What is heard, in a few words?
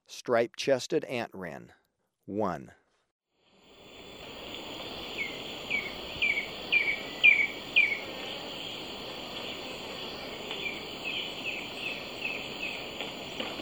Stripe-chested Antwren, Birds of Ecuador; S. America